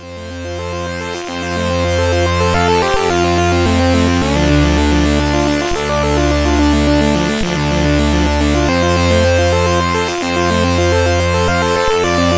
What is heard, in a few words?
sid CSG8580R5